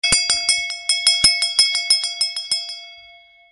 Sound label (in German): handglocke